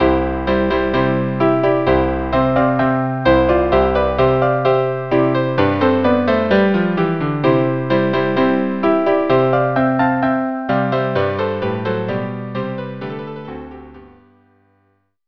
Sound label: march; purcell